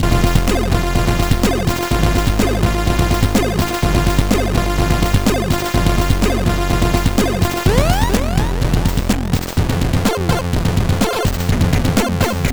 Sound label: Music-Disk tune